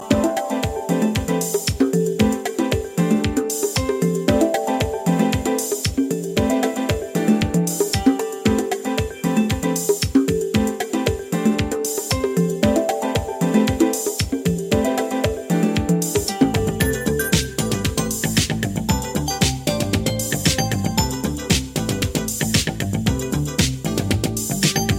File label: piano sunset